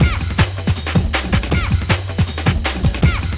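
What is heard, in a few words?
drum loop; X-Static Goldmine 3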